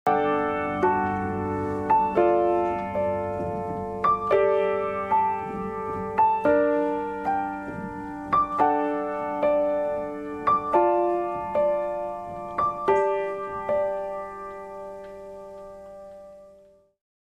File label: High Chords DRY